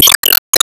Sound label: Keep Lock; Se Sys Keep Lock; Disney Star Smash; Android, iOS, Mobile